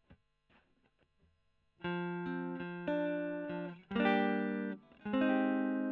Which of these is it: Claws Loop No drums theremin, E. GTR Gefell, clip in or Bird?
E. GTR Gefell